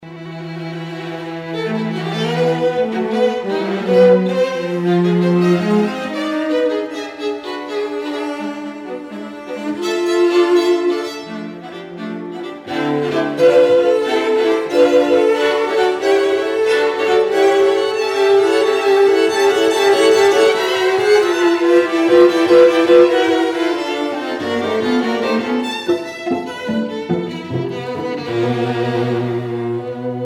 Streichquartett Allegro moderato

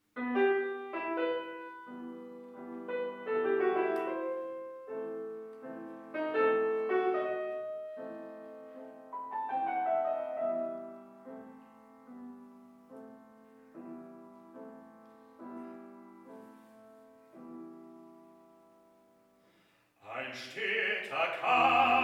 Klage

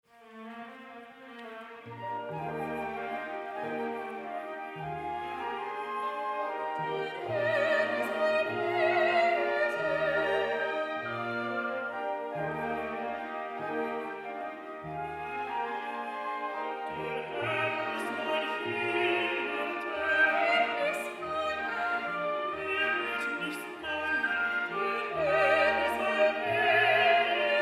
Duett und Chor Sopran Tenor; V Die Wiederberufung; Die Gemeinde

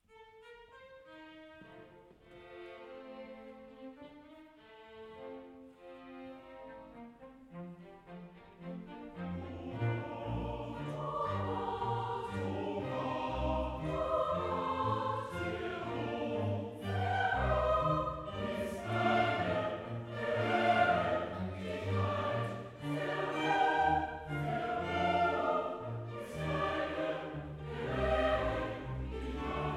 Chor

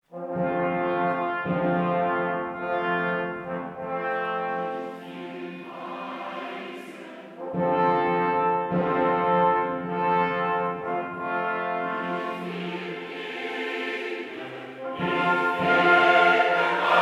Chor; I Der Fischzug

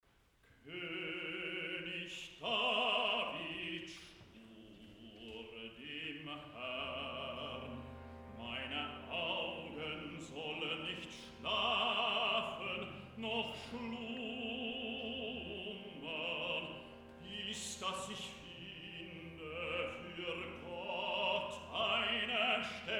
Rezitativ; Nathan

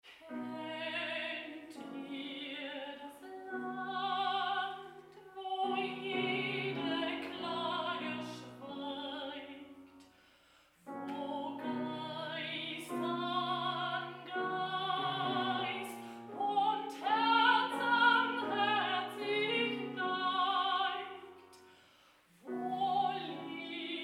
Trostlied

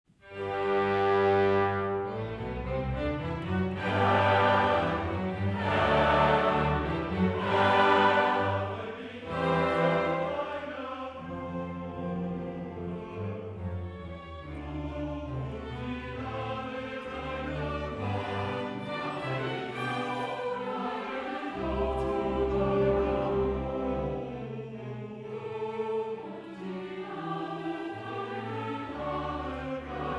Chor; Das Volk

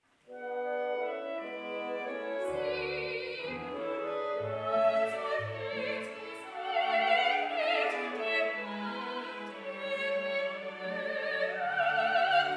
Arie Sopran; I Der Fischzug

